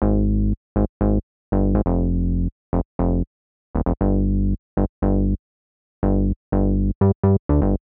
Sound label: Bass, 120bpm; Zero-G; Nu Disco; Nicengroovey C